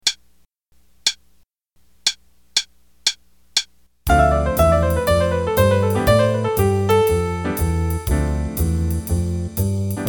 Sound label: Play a long Trading with Jazz Rhythms Dmin 32; D min7 (D Dorian) (32 bars); Trading with Jazz Rhythms, Set 6; Jazz Improv Play-a-Long